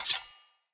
Player Short Sword Fast Equip; Weapons; Swords

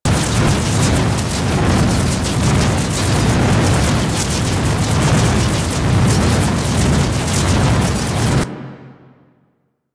Powerplant Exterior Battery Damage Loop; Warframe; Corpus; Railjack; Tether Power Plant Exterior

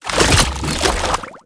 Water Sprayer Reload; Warframe; Weapons

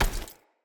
Foley Action Default Body Hit, Default Clothing; Player

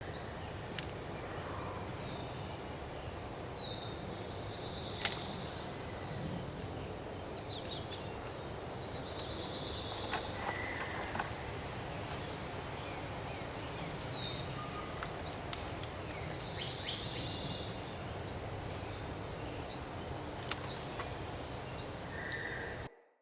Day Amb Loop, Ambience, Glades; Glades Of Andurin

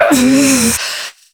Pain Long; New War

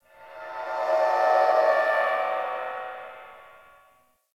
Interior Cave Tonal Drones; Ambience; Indoor; Warframe; Infested Eris

